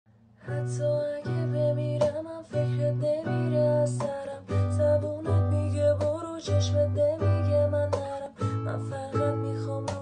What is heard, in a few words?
Guitar